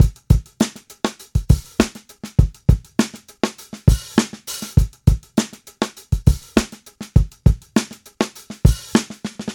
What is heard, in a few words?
big room bass drum single hit g key, Royality free steel kick drum sound tuned to the G note